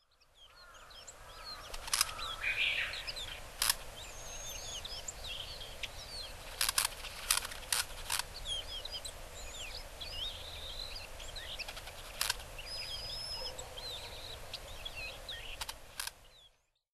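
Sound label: Red-flanked Bluetail; hyogo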